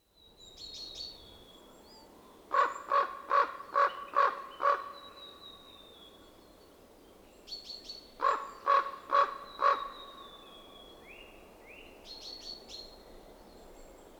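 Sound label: corvus corax raven; zvuki voronov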